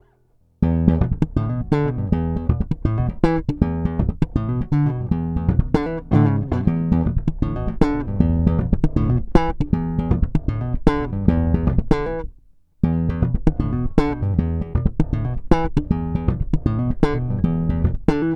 Slap neck coil

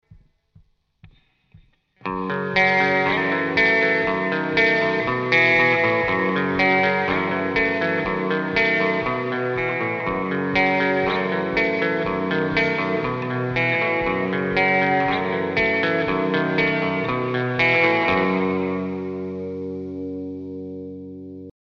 clean

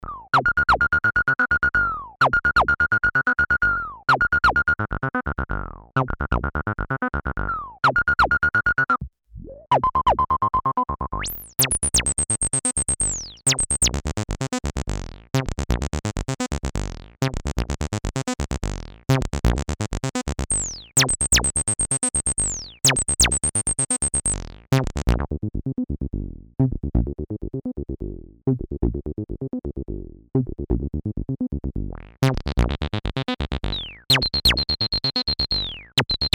TD 3 MO Accent Sweep

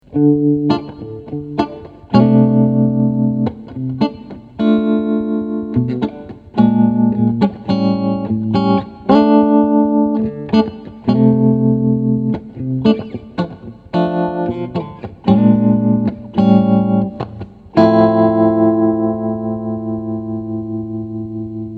TremLord Tremolo

